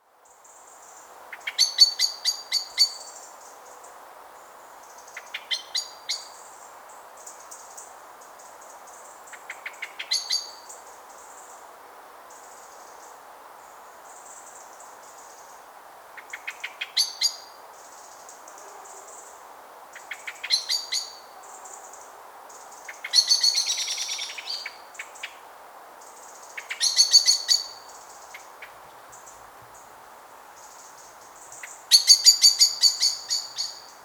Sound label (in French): merle appel